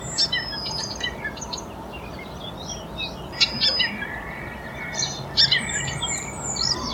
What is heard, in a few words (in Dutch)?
orpheusspotvogel zang